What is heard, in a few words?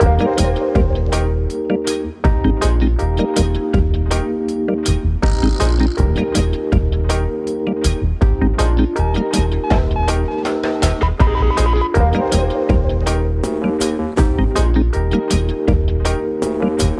skank